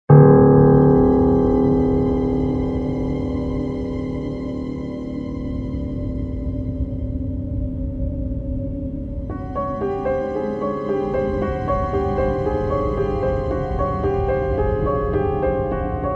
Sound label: suspense